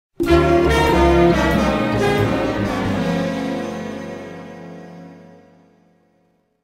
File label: Stinger Game Lose; Family Guy: Back to the Multiverse